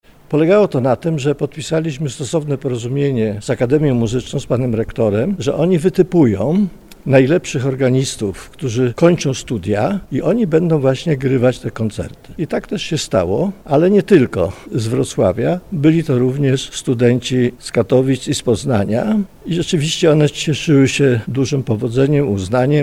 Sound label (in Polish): organy